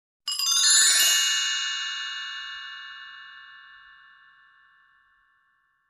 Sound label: Granted wish sound